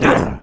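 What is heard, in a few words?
Hob Damage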